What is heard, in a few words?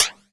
DRM HAT Digital; Polyend; Plughugger